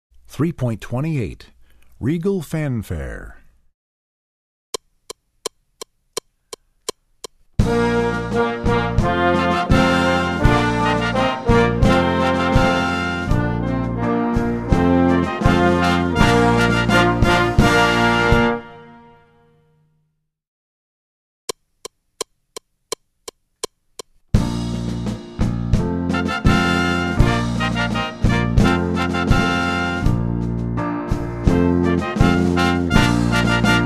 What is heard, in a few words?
Regal Fanfare